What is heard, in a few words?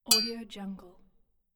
sword hit